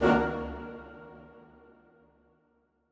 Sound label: Woodwind Ensemble Hit